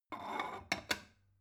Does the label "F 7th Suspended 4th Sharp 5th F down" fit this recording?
No